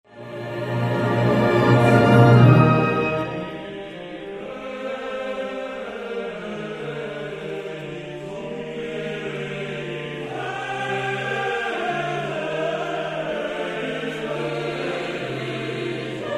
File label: Requiem Kyrie